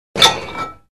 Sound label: Taking off a plate of a barbell 10 pound weight